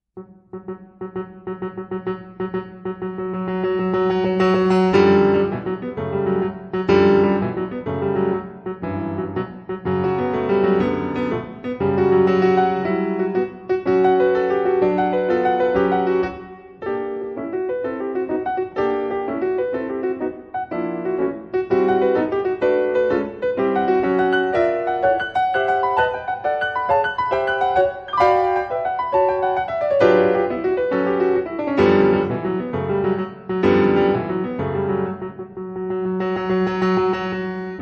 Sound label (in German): Sonatine Finale, Konzertpianistin; Constantinidis; Sonatine Nr.3